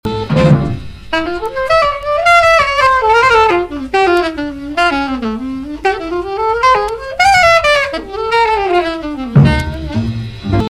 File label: Tunisia Break